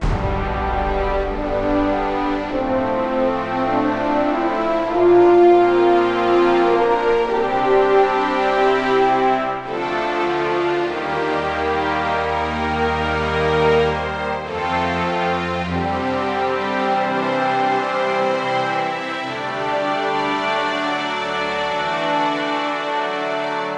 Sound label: Results Win Loop; Dawn of Titans; android